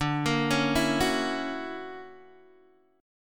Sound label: D Augmented Major 9th down Guitar DADGAD